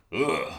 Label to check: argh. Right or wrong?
Right